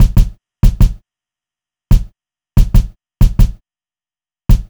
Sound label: beat, Roots Funk; USB Soundscan vol.41; AKAI